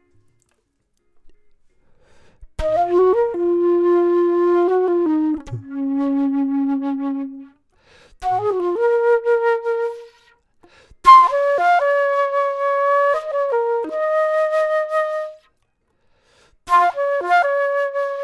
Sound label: flute; logic